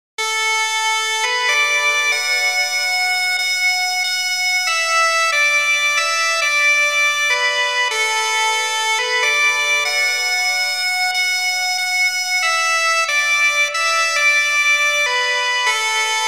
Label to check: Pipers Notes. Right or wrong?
Right